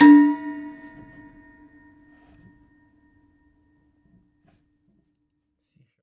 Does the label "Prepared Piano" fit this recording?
Yes